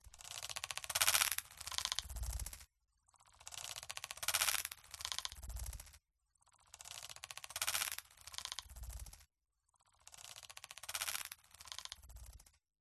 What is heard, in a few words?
alien special device chatter futuristic mobile app pick up sci fi mechanical glitch; epic stock media